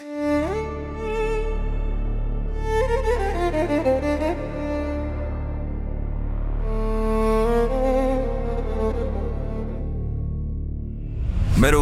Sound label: Iron Strings